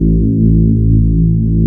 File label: CMI ORGAN; Wizoo - Powered DX